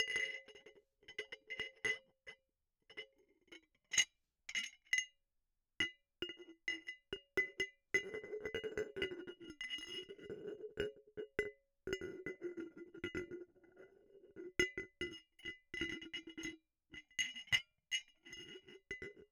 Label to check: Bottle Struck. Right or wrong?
Right